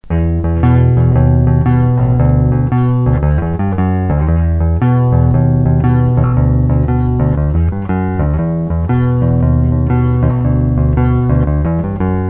AGB200 bridge plek

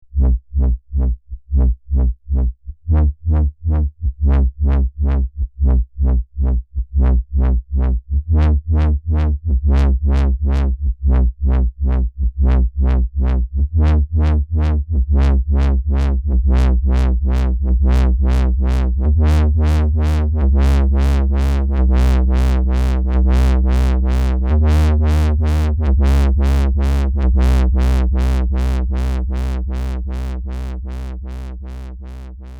Doepfer Dark Energy III Wobble Bass

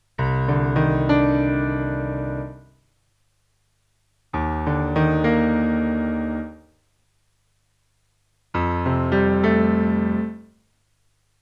bbd reverb

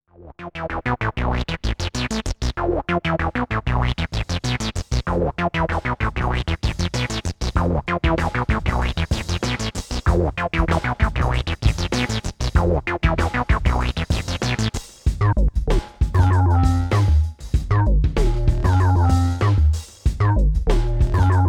various basses

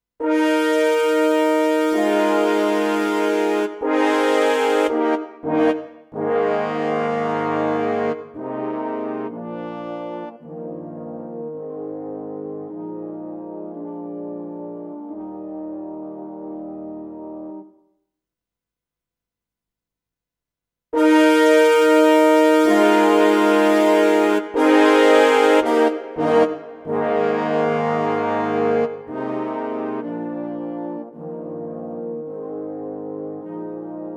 brass

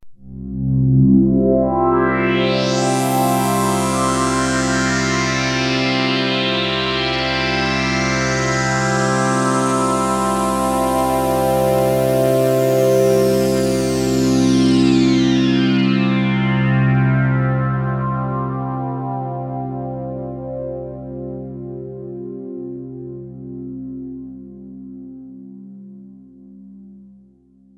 Synthesizer Sweep

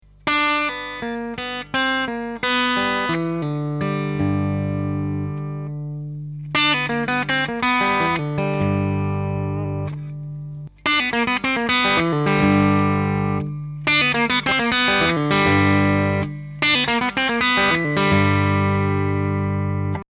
Lick

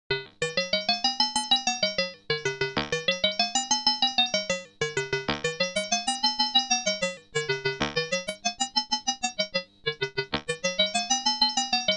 PAiA VCO

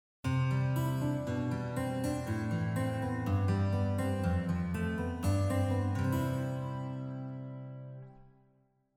Guitar Plate

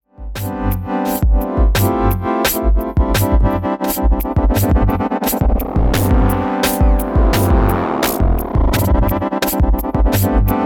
Motor Tremolo Vibrato Panner; AudioThing Hainbach Bundle

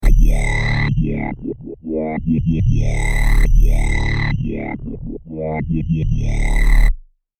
Bass

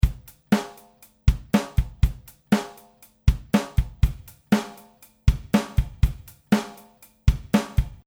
Beat Ambience

